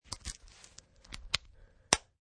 Buttoning Metal; Unbuttoning metal buttons on a leather wallet; Snaps; Unbutton Metal2; Snap Sound Effects; Objects

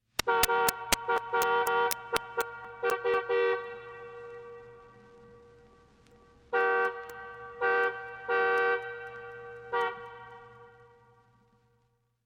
Car Horn

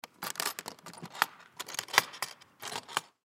Baritone Saxophone Case Latch Lock; Brass; Blastwave

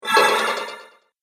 Robotic Droid Noise; Robot Sound Effects

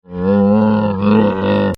Camel Voice; Camels; Animals; Partners In Rhyme

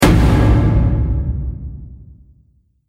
Explosion Impact Tunnel, bomb

